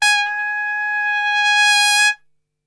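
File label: G#3 trumpet, Brass Super Section; Best Service; TRP SWL; 90 s Sample CDs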